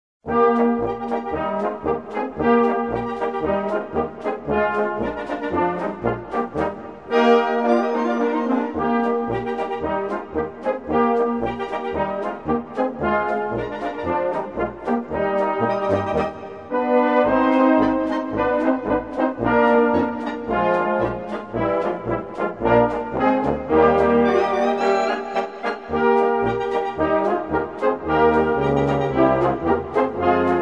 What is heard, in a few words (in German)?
Polka